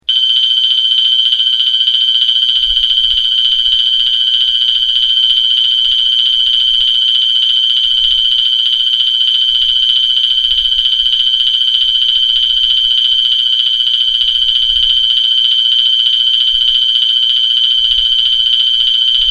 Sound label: wheelock mt4 115 wh bell